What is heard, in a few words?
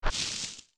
steps tall grass; OOT